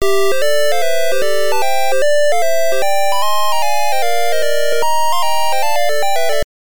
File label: Game Start Music, MSX; galaga